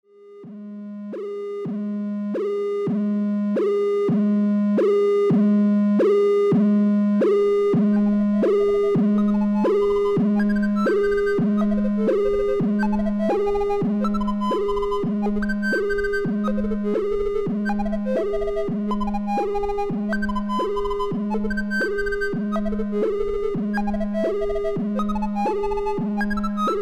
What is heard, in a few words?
Serge Crocodile VCF Low Mode